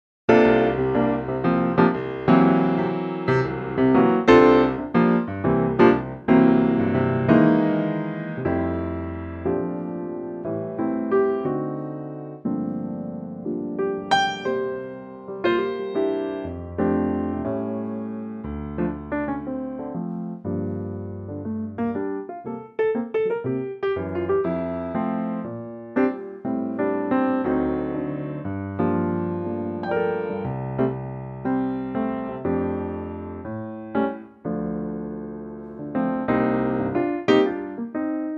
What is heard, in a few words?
F piano; Pianotrak; vocal range - C to E